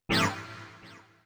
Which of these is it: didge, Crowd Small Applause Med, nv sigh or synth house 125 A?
synth house 125 A